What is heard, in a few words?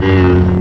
swing